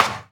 Minimal Perc; Minimal Percussion

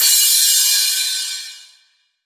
Crash; Vengeance Samples; VES1 Cymbals

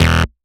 Synth Bass; Vengeance Samples; VEE Synth Shots

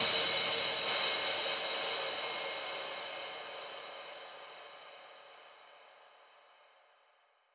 Crash 138BPM, Drums & Perc; A#minor; Fusion Kit; Vengeance Samples

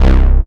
Bass C, Offbeat Bass

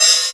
Cymbals HH Open; Vengeance Samples

